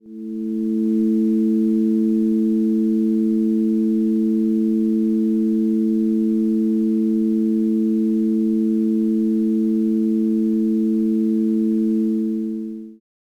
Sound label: ambient